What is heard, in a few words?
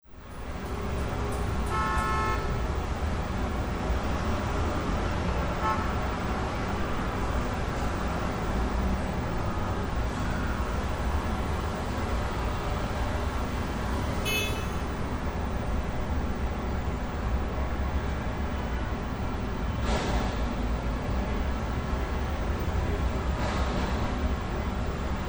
busy street